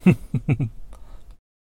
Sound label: laugh to self